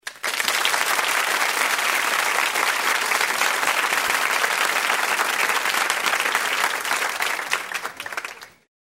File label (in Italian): Applauso